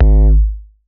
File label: Bassdrums Hard, Hard Kicks